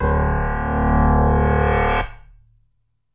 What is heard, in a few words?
Moo Piano D1, moo backwards piano